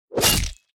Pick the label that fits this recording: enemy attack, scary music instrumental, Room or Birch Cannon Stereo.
enemy attack